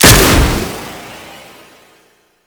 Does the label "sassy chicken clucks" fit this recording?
No